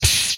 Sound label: SciFi Gun; retro arcade; Guns